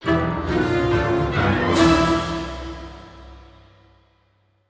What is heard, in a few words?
(Jingle) Death; Gnasty Gnorc's Cave